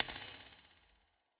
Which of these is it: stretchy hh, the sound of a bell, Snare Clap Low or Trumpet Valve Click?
stretchy hh